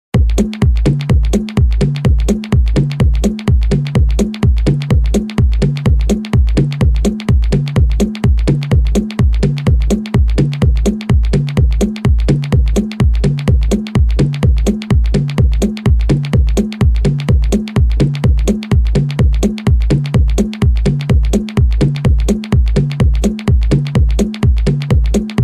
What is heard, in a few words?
catchy drop sfx